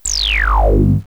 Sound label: Forcefield Disable; cirnogodot; Artninja